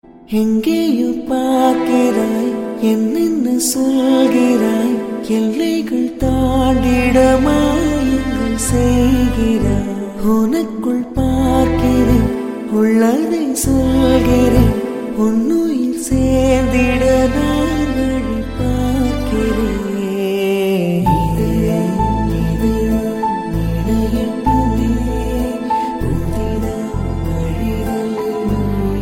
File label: Acoustics